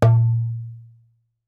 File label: darbuka note